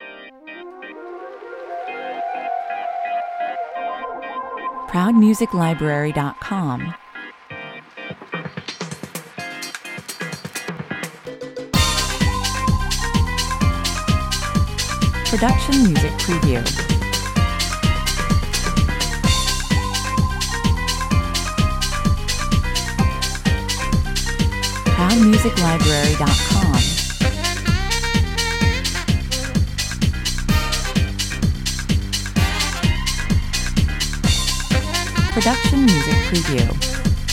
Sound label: Latin Dance Beat